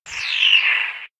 ptera vox mumble

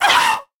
Archa sound

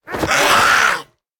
Microraptor sound

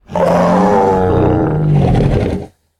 Chalicotherium sound

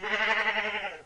pachy mumble

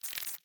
Dragonfly mumble

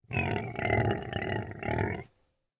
lystrosaurus mumble

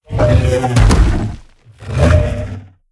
Kentro sound

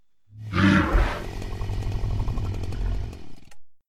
Helicoprion sound; ARK Additions

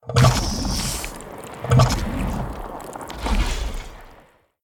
Lamprey sound

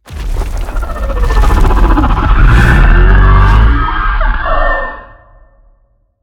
Bronto sound

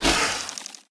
spider voc attack melee

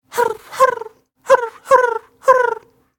jerboa alert sandstorm

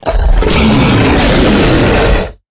Megalosaurus sound

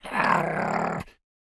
Beaver sound

